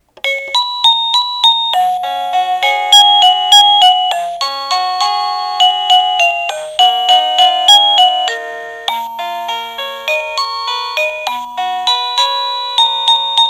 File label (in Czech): melodie